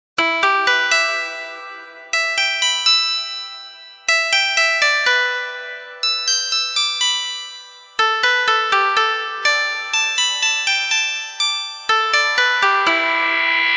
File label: techno lofi